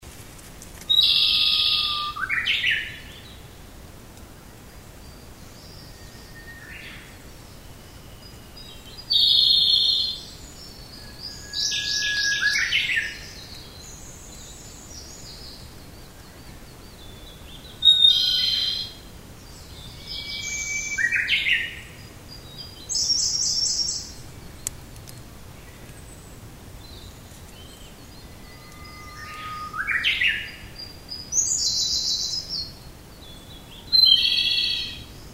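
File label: komadori, Japanese robin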